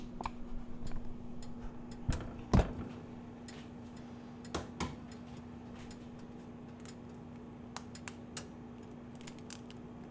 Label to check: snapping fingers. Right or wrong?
Wrong